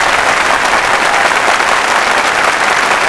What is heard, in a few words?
Small Crowd Clap; David Beckham Soccer; XBOX